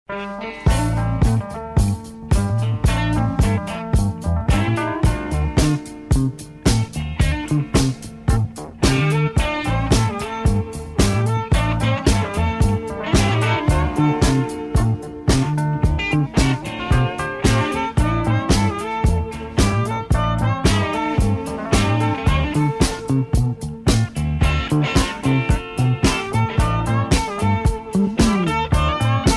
Riff, harmonica

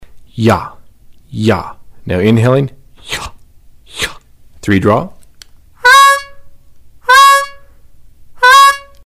Dip Ya, harmonica

